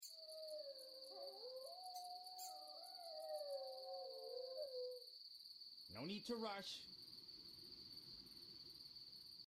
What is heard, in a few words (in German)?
raum nacht; The Witcher